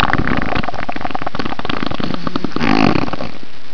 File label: fart